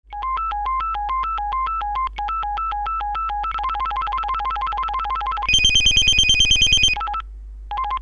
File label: sound phone; voxtel 2500